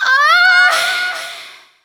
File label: female orgasm